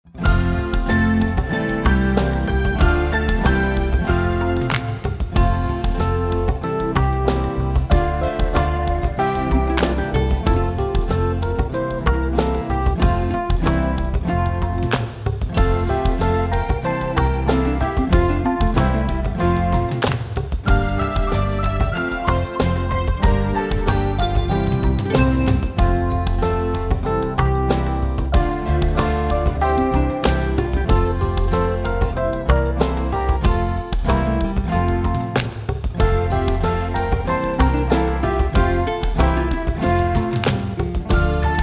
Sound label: Karaoke